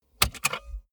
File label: car bmw e46 interior safety belt clasp release hard